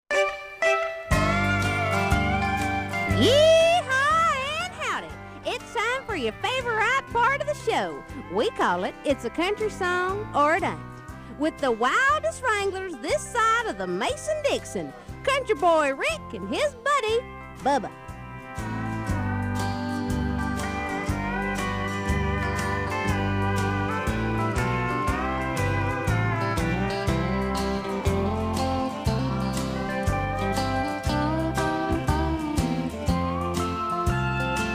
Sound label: country song ain't